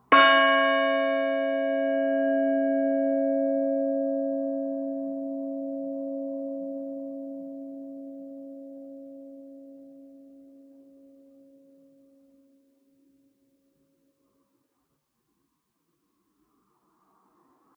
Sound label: bells, fairwarp